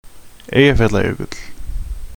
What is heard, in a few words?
volcano